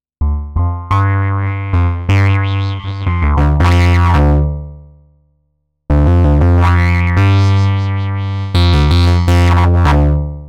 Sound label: Waldorf Modular Complete Patch Lead